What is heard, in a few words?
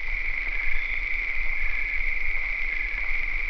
CRICKETS